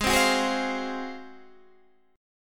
G sharp 13th, Guitar, Standard; G#13; strummed; 16,15,16,13,13,13; down; capo=3

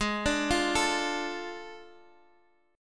A Major 7th Suspended 4th Sharp 5th

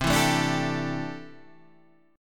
C 6th Add 9th C down Guitar Open G

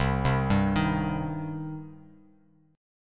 C Minor C down Bass Standard; arpeggiated; JGuitar

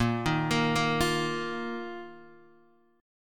A sharp Suspended 4th A sharp down Guitar Open D

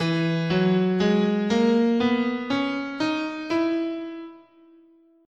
E Lydian, guitar, scale

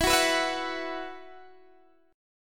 E Suspended 2nd E, Guitar, DADGAD; x,19,16,16,19,16; down

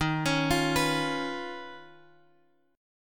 D sharp 7th Suspended 2nd Sharp 5th Guitar DADGAD

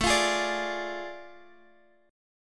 A Major 7th Suspended 4th Sharp 5th; chord

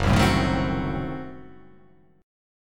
F sharp Diminished 7th F sharp

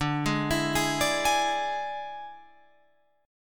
D Suspended 2nd Flat 5th D, Guitar, Open F; 14,15,16,15,17,15; arpeggiated

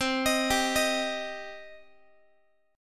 C Minor C down Guitar; arpeggiated; JGuitar

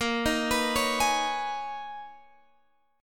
B Major 13th Bb, guitar

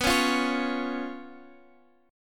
B Suspended 2nd Flat 5th

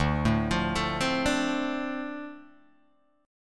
D-Minor 7th D Guitar Open G, chord

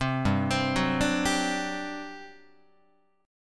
G Major 7th Suspended 4th, Guitar; arpeggiated; 4A,4E,5A,5D,5G#,6C#

